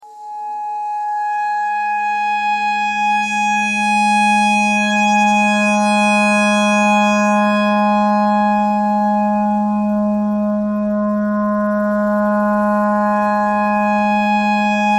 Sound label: clarinet clarinet notes e simple